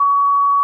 Answer Machine Beep Generated

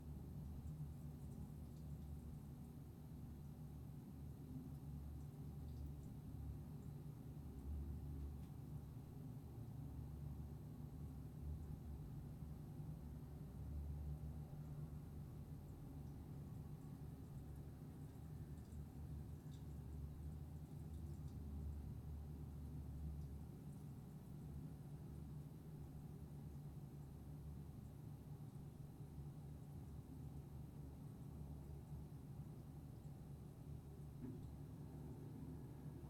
Bathroom

